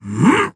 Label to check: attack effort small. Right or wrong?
Right